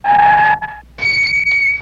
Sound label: Car Skid High Brake Sq